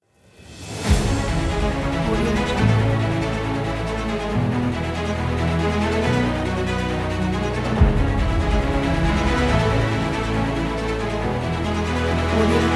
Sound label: heroic epic cinematic